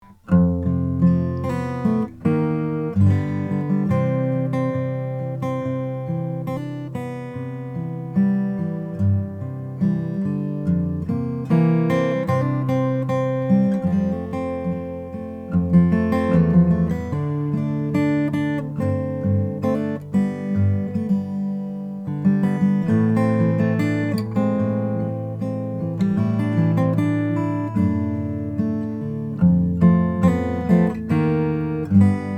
collings sj sb european maple

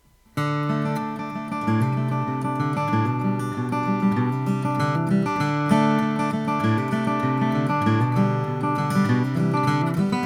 froggy bottom p 12 mahogany

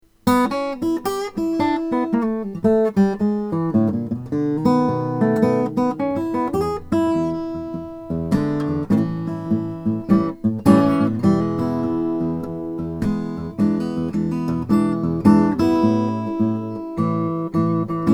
martin 000 18 mahogany

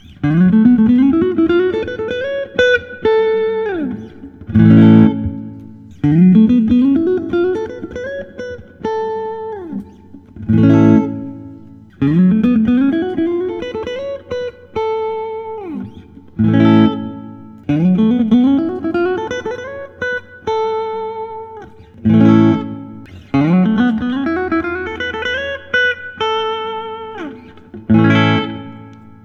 thorn socal r s bronze swirl

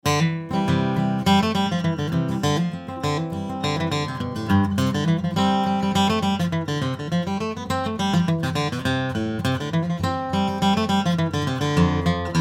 guitars; martin co cs 21 11

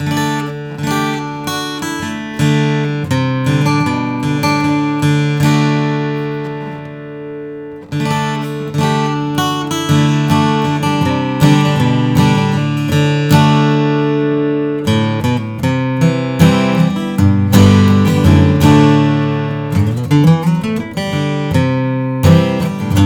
k24ce shaded edge burst, guitars; taylor

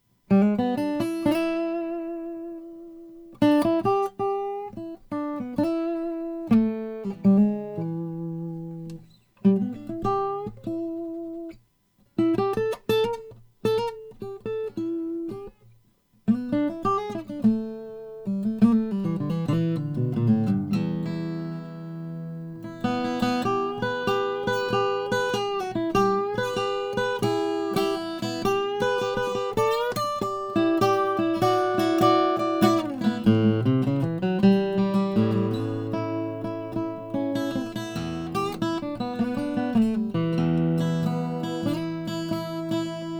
collings 002h indian rosewood

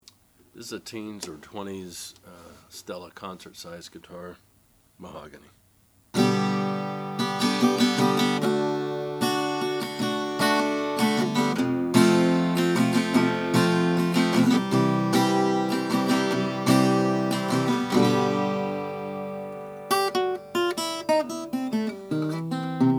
oscar schmidt stella natural; 1920

